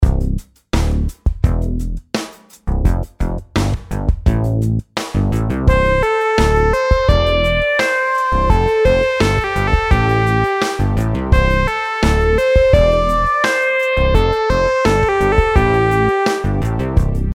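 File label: melodies simple 8s triplets C major; melody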